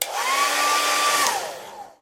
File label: hairdryer